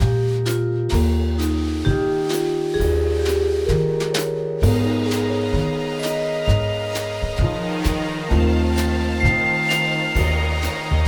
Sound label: Tutorial Loop; The Princess and the Frog; wii windows; Tutorial Music